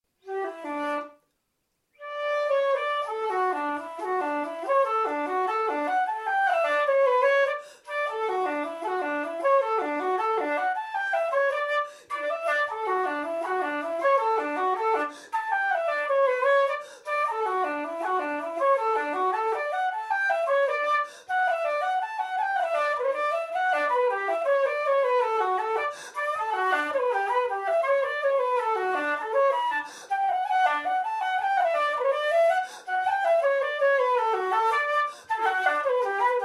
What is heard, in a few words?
Jig, DADGAD Guitar Accompaniment